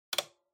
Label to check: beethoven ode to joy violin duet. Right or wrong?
Wrong